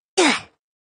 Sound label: roblox death sound effect